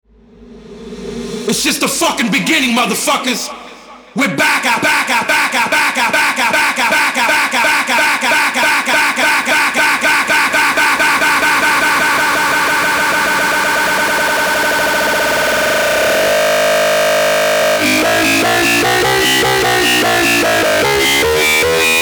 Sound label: remix